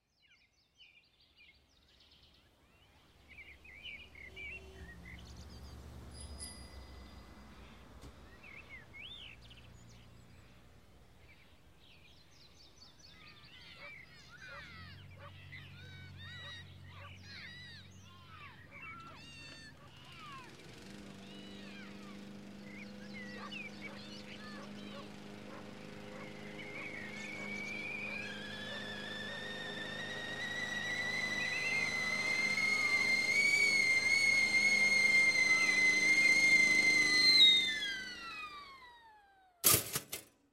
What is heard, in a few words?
opening soundscape